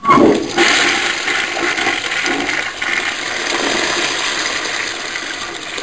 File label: flush, mountain hamlet ambience